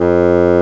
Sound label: Orchestral Winds Bassoon; Roland LCDP04; WND Bassoons